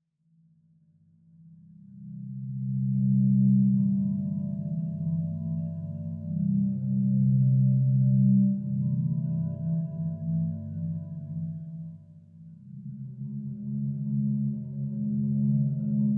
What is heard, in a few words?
bell and chime drone